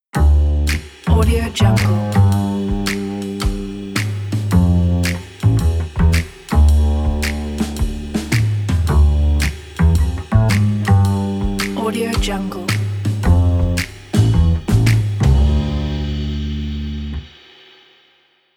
upright bass light jazz logo

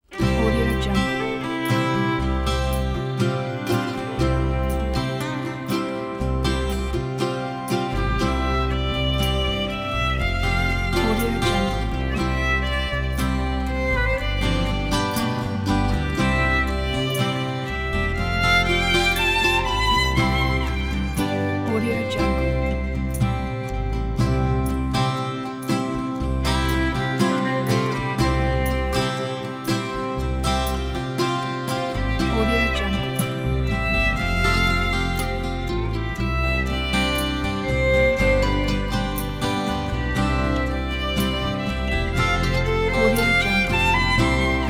easy country fiddle

